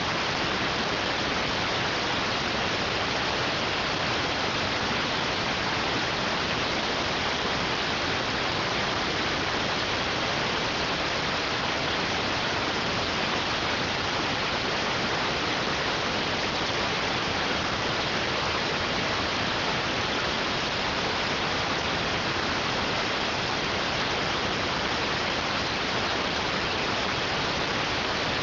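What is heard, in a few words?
radioteletype receive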